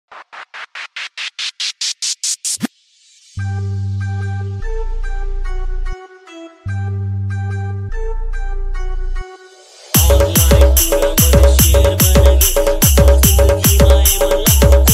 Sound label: dj song, Cg